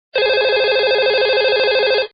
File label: phone